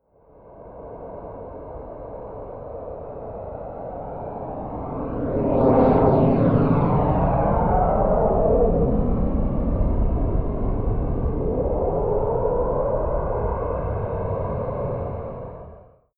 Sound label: military jet eurofighter typhoon flyby right to left fast distant; flugzeuge jets; verkehr transport